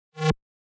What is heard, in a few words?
Fill Tech Rising square 160 D#; exciplex sample presets pack; JinoBeats